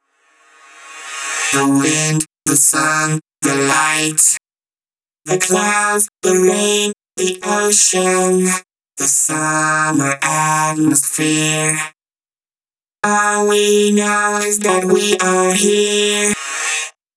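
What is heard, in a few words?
Vocoder Vocals Verse 1 DRY, 128 BPM; Vengeance Samples; VTDS2 Song Kits; Vocoder Mother Earth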